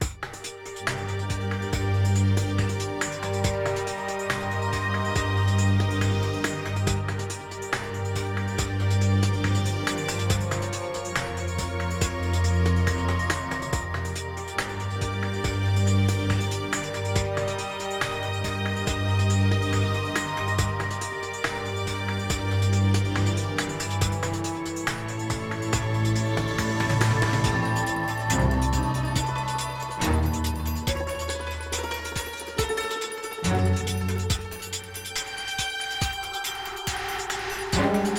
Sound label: Stealth Loop; bolt 2008 pc gamerip; RDX